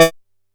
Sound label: E4, Bass; E-MU Producer Series Vol. 6 – Analogia Project; Boom da Bass